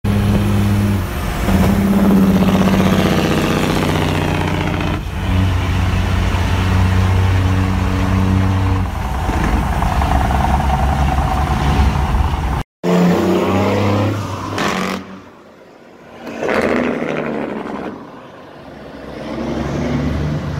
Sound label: semi engine sound